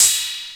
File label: ELE RIDE, Drums Dance & Groove; USB Soundscan vol.11 - Drums Dance & Groove; AKAI